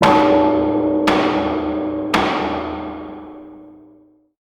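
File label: PVP Game Capture The Flag They Scored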